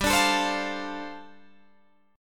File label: G 7th Suspended 2nd Suspended 4th, Guitar Drop D